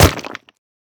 stone impact small

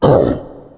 death summon scythes

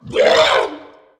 growl

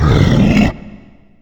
death growl

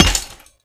scythe break

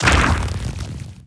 bounce; carrionqueen

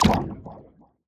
shot; little horn

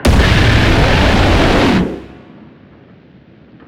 brimstone lazer big

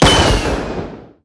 glitterbomb boom

